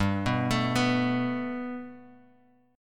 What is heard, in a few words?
G Major Add 9th Guitar Open F, chord; 7,6,9,6,x,x; Gadd9 arpeggiated